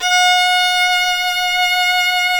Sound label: SOL VI 20, Synclavier Strings; ILIO